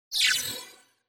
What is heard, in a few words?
Cyber